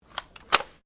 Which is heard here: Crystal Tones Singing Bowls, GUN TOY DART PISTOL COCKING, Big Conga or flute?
GUN TOY DART PISTOL COCKING